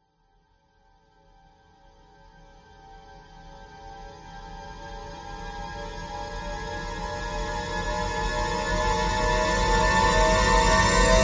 horror riser reversed synth strings lightly ascending tense scary